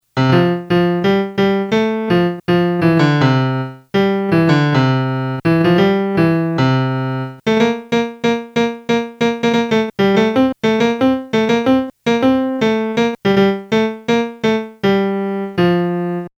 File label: melody